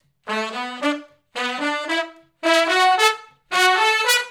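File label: R&B Riff (B) unison; Sections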